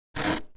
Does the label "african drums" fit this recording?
No